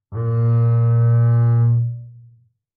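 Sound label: Bass arco fortissimo sul E Db3, Double Bass; MIS Pitches - 2014; Strings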